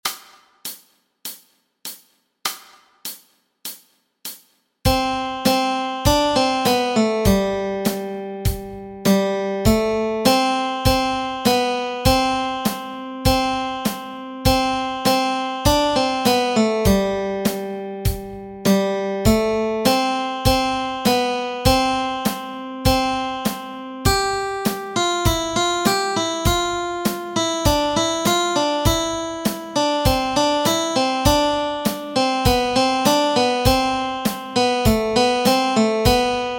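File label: melody, Easy Guitar and Ukulele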